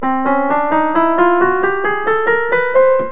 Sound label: Chromatic Scale Piano